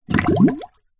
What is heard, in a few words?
nature water bubbles metal hollow pole sink submerge plastic tub